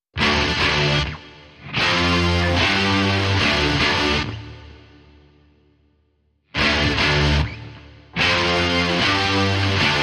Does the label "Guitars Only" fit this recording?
Yes